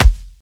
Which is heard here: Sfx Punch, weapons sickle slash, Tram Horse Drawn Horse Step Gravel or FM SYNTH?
Sfx Punch